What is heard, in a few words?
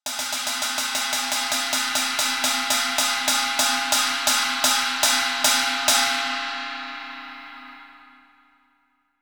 ride